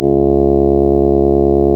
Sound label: REED C#; Big Fish Audio; Synclav FM